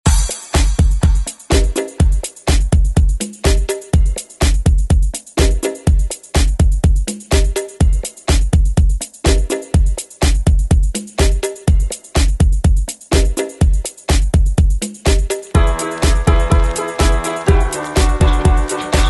90s ReDrum; DJ Mhark

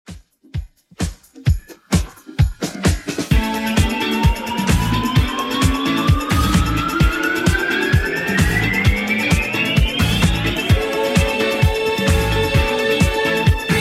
70s Redrum 130bpm

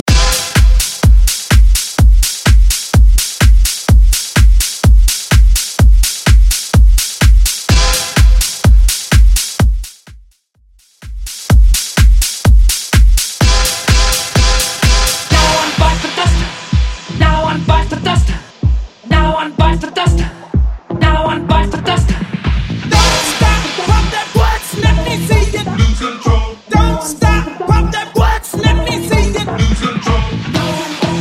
80s Rock Bass House Edit

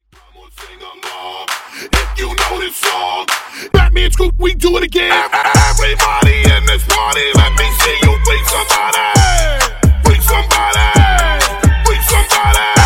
Funk Hype Intro Outro 133 Bpm

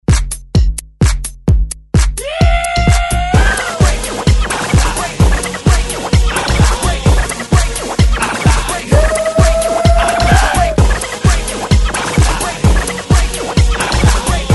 20s Hype ReDrum 129 Bpm

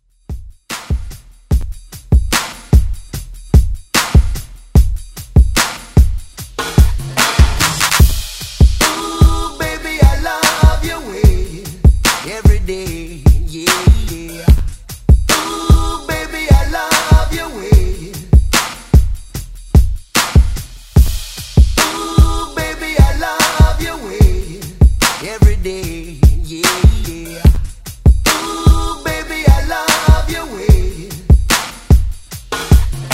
Reggae Ext Redrum

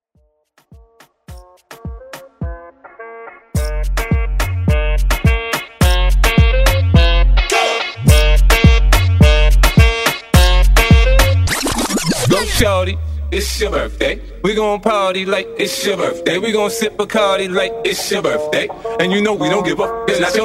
Moombah Mash

